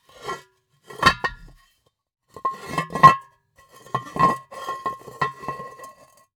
Cookie Jar, ceramic, glazed, irregular shape, hollow base, hollow top, rough, resonant, tonal, jostle; long; strong; Eiravaein Works; Vaeyan IV